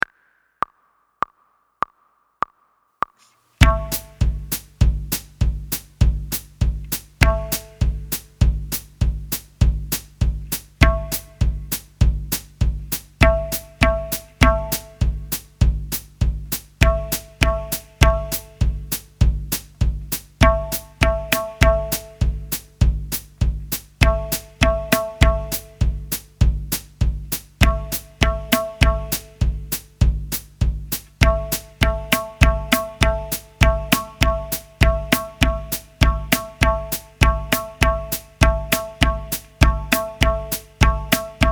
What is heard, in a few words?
Ta practice with tabla